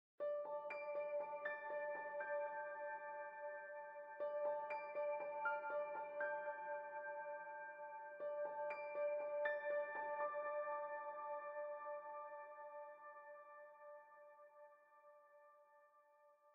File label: Citadel Piano; Frozen Flame; windows